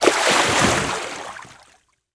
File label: water movement splash